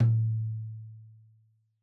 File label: Tom2 Stereo, Deeply Sampled Drums; SMDrum